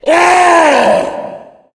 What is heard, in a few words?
tank yell, voice yell; Left 4 Dead; Source Engine